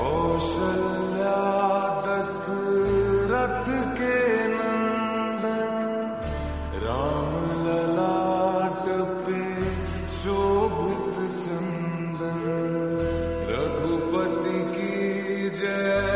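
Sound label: Slowed Reverb Ringtone, bhajan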